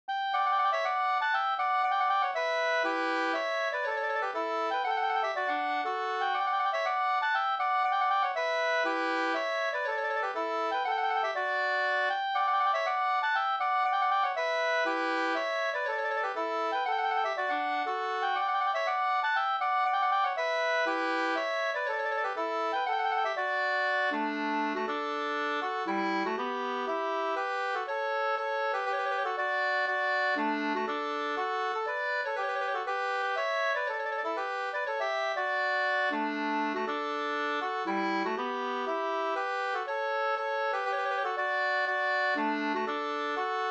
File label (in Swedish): polska Bodapolska